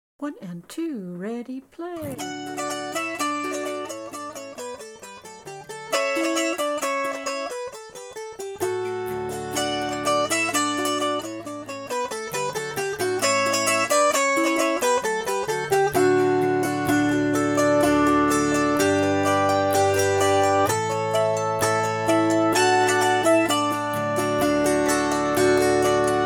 Prairie Sunflower D

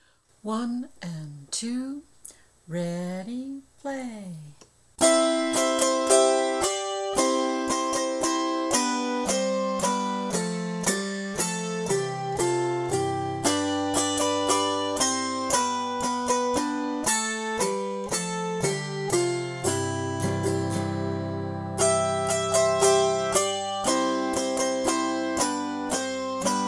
Prairie Sunflower C

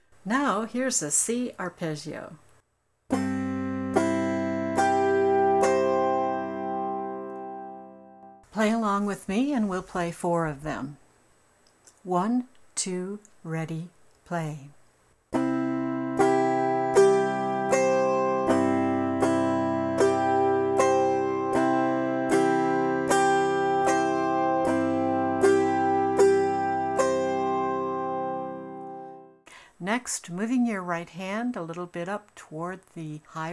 Arpeggios

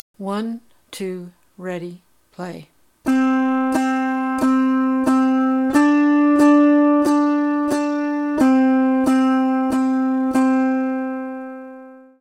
Do Re Do four plucks each

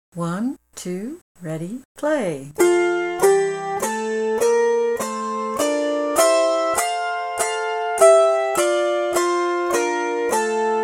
F exercise

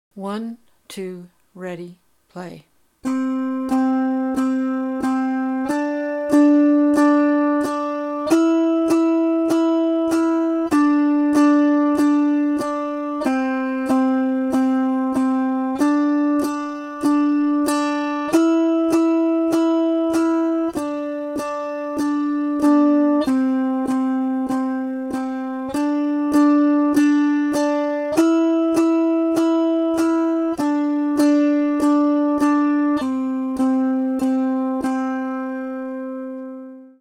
Do Re Mi Re Do 4 plucks each

